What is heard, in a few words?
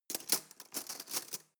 cutlery teaspoon drawer handle